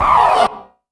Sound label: E4, synth line; 90 s Sample CDs; NiceBeats; SynthLine VOL-3